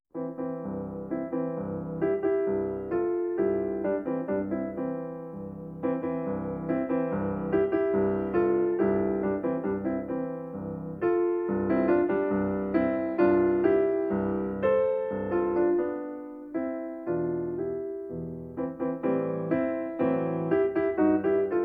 ten easy piano pieces hungarian folksong; bela bartok

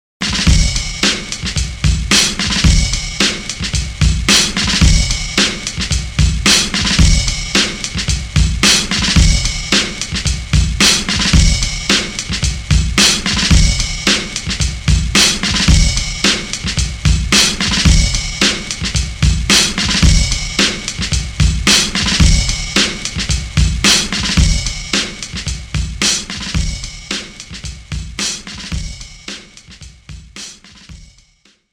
drums interlude

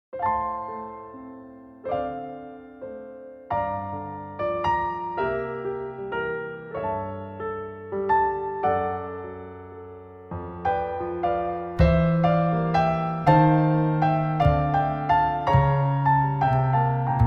jazz lounge, waltz

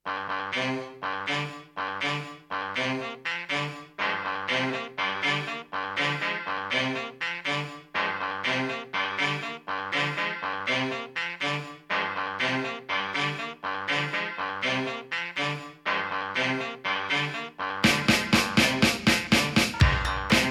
instrumental

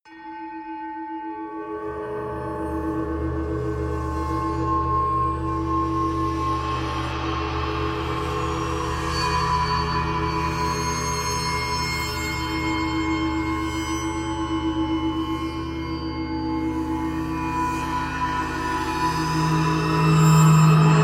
no lows or pads

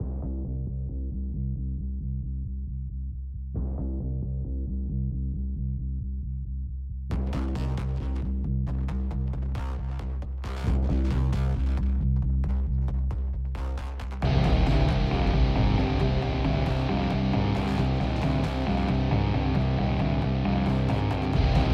no choir

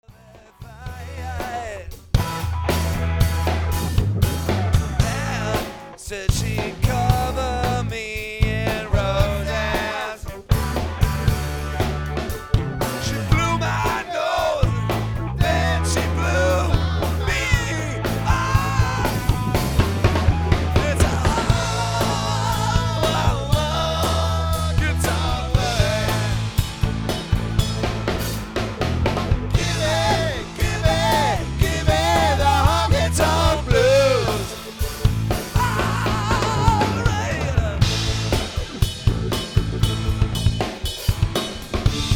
studio jam

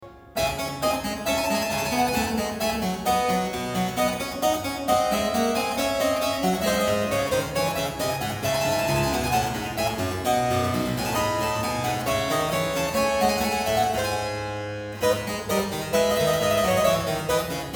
english suite in d minor gavotte da capo; n 6; bwv 811

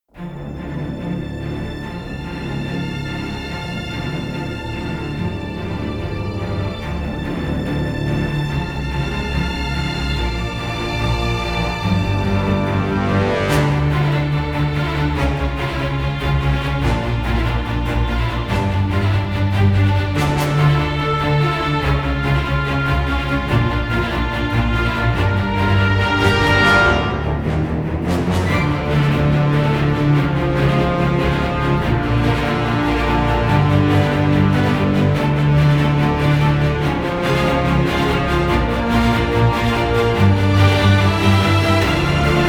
no percussion

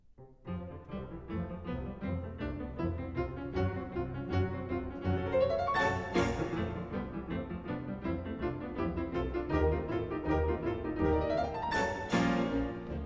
piano concerto no 3 in c dur allegro giusto; sergei prokofev; op 26

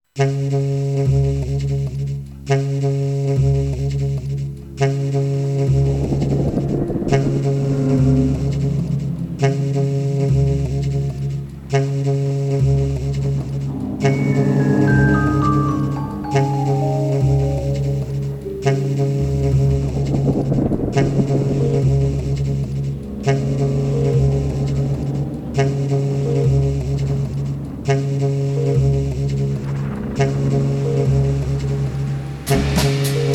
drum rain

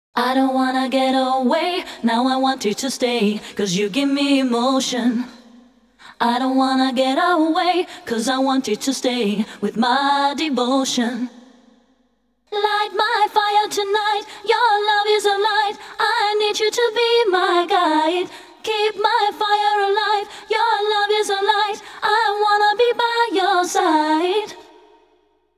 chorus accapella

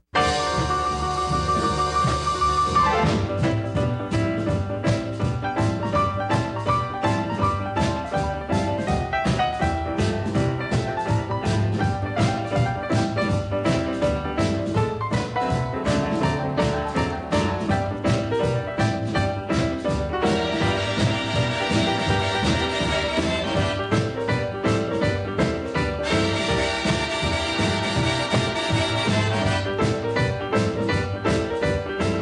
orchestra instrumental

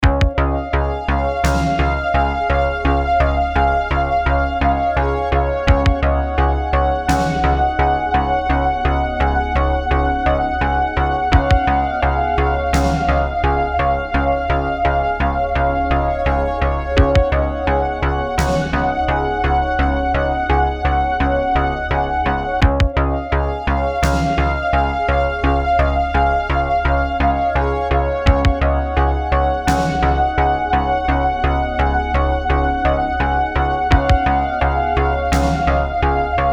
80s ambient space music chillwave

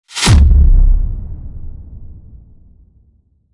hit big

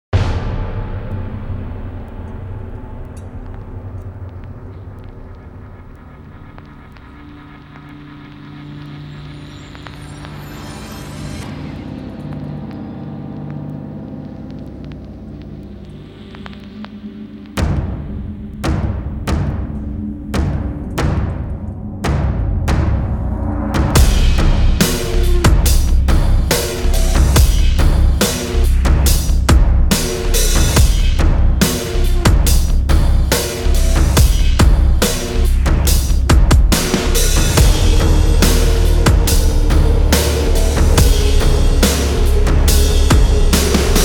no horns no noise

